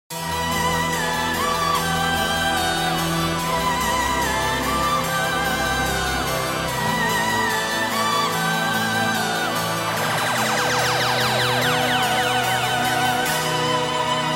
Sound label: Deutschrap Drumkit; Volume1